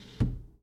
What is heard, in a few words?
Foosball Forward Slide